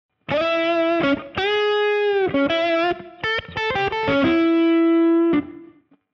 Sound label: lick